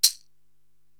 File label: Caxixi Hard